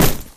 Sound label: item pack drop